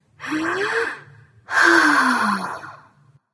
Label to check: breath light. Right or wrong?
Right